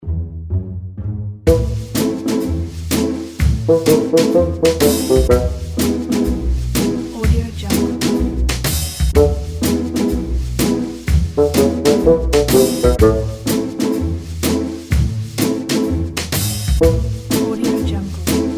funny humour comedy

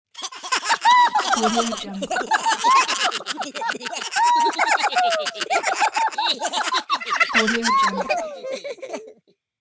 cartoon group funny laughing; applehillstudios

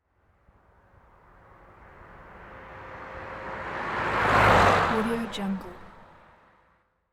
old car pass by

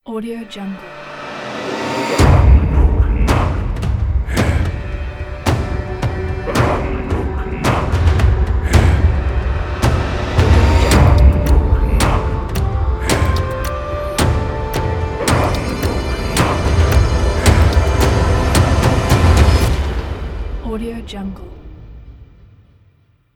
vikings logo; wavemusicstudio

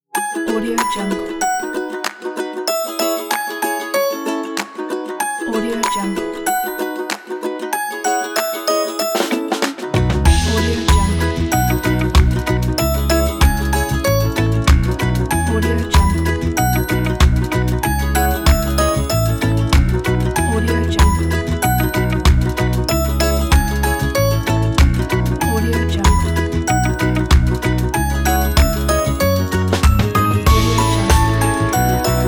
raindrops

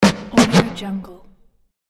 dj turntable scratch; bekibeats

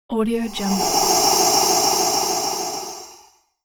horror myth surreal air

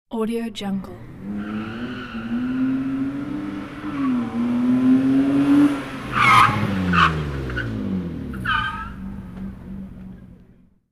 race car stopping

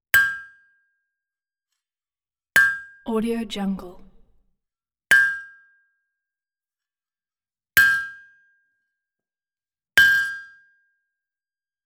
forging metal on anvil

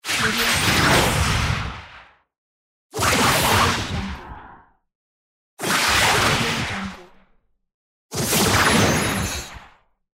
double swish variation

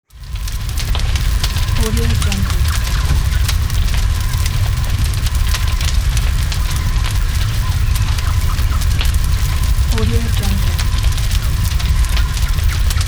fire in forest

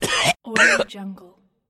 a cough

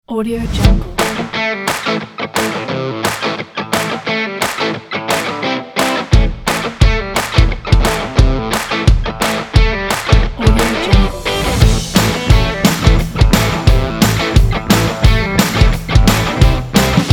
upbeat rock

